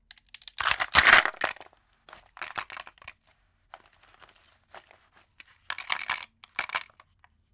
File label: Ice Cube Tray